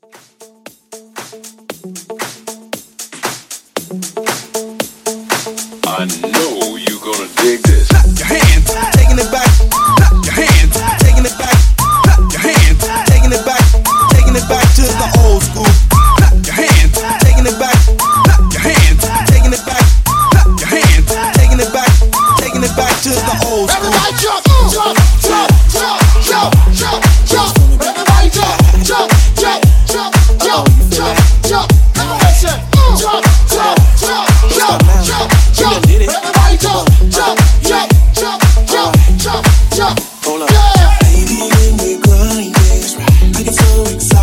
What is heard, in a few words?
Nudisco Hype Clubmix 116